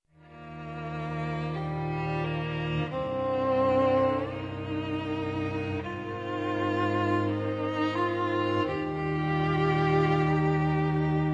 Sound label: String Quartet